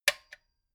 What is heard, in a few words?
metal button stereo spring click medium